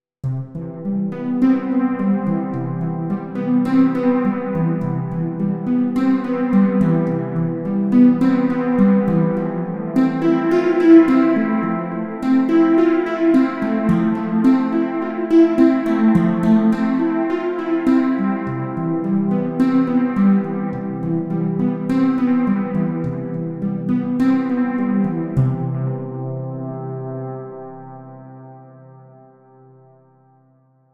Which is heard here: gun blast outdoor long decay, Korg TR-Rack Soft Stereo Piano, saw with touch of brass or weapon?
saw with touch of brass